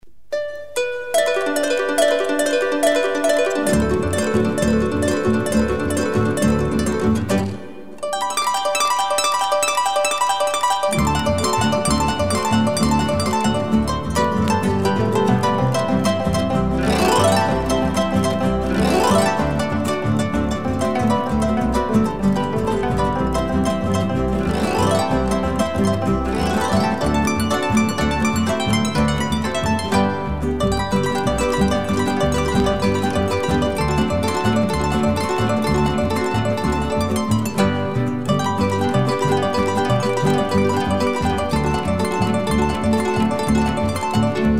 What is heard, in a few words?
harp tumba